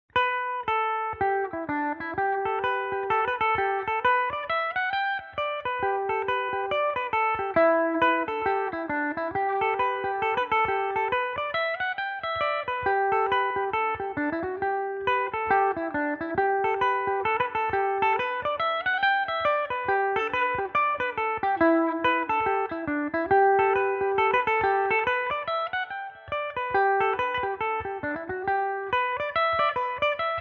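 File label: G major, Irish mandolin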